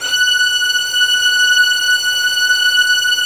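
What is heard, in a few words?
violin section, Arco Violins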